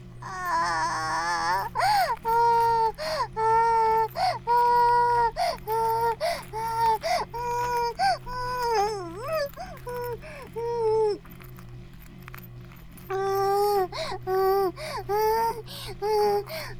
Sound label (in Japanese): バイブ放置